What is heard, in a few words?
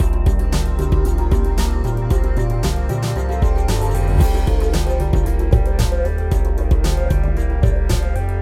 Fill